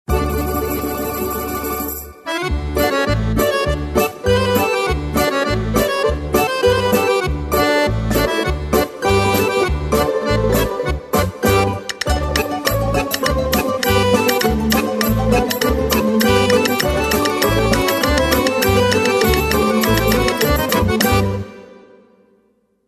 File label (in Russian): Veselaya plyaska